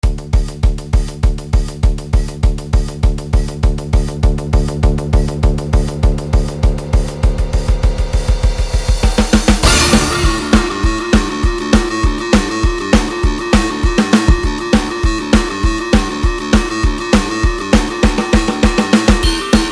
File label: battle theme regular